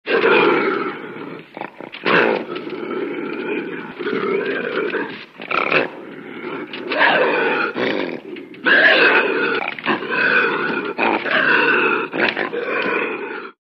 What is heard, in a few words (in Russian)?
rysi golos